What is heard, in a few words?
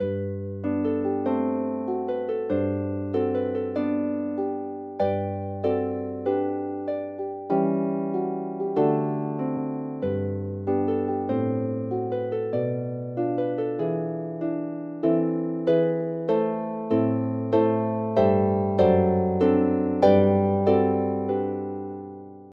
Harp